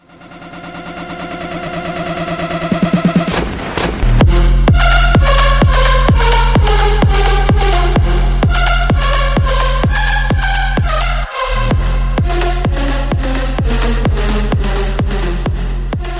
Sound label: Breakdown Giant Drop